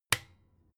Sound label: desk lamp on hard